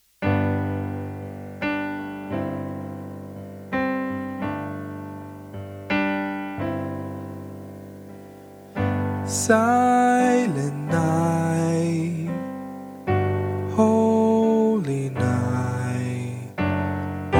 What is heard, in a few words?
silent night sop